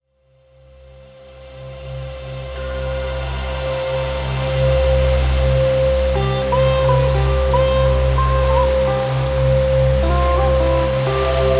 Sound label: 528 Hz Solfeggio Frequency